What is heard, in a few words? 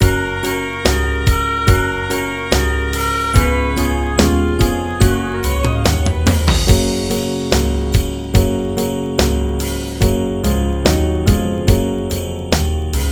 Jingle Bells Playback